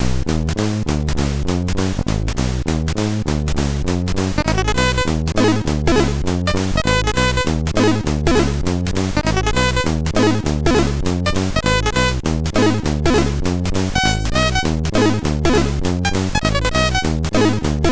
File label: Swing, MOS6581R2